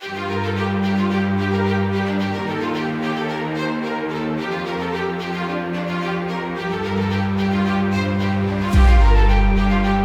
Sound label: Menu; FiE Game; lobby